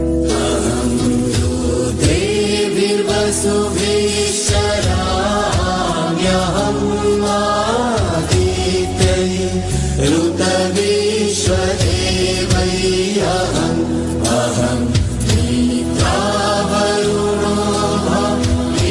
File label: Devotional Ringtone; Mahalaya